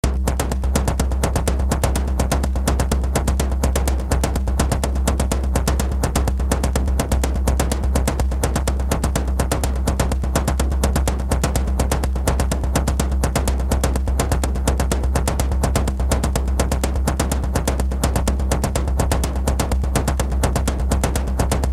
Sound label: rapid drums beat intro great for club